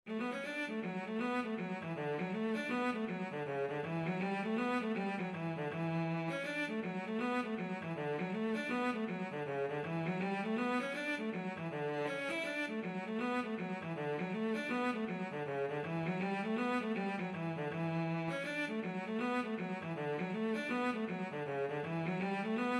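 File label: violoncello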